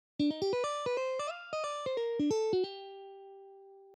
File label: minor 2 5 1